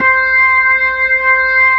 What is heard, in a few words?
B3, Drawbars